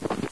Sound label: snow, footsteps; Source Engine; cstrike